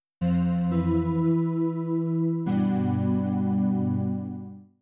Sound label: gameover; YokaiSurvive; GODOT